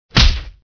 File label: punch